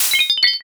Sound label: dish break